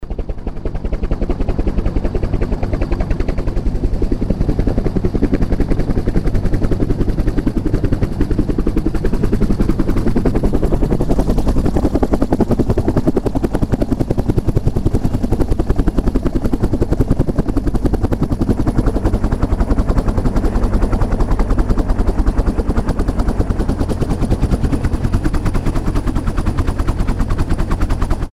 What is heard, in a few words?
helicopter manoeuver